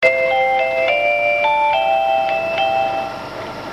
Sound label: bus chime; 国際興業バス